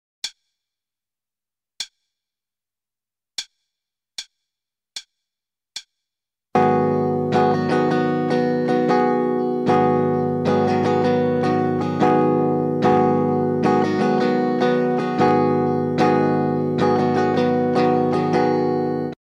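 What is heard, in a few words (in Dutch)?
Ritme, gitaarstarter; Meespeel CD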